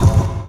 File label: Vinyl Fx; Sonic Foundry (Sony Creative Software); Esoterik Beatz